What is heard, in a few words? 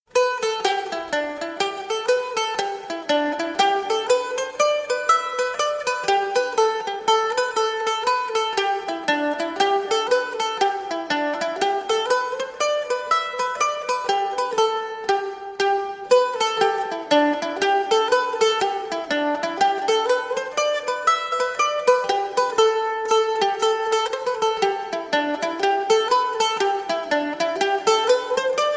mando